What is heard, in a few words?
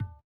tabla, Indiatek; Spectrasonics; Metamorphosis